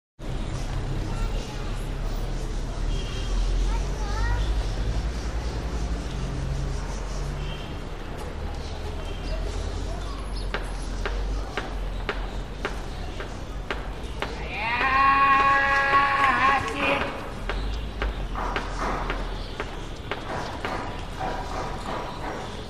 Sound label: Cairo Egypt Urban Ne